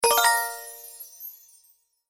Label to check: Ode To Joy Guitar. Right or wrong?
Wrong